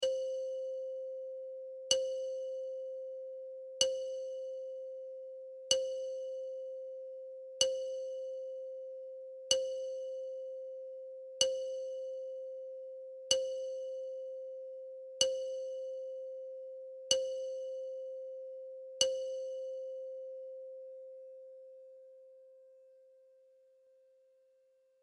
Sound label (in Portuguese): amostra de pulsos com periodicidade